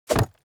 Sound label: object grab stone